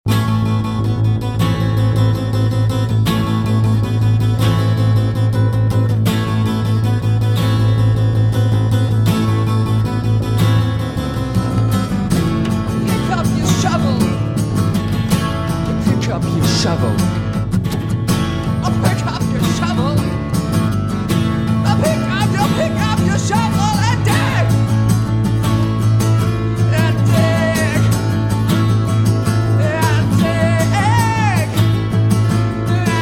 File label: Acoustic